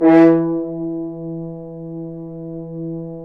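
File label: french horn, accent; Roland L-CDX-03 Disk 2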